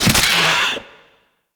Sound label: Bird Of Prey Death; Ambience; Eidolon Plains; Eidolon Landscape; Ostron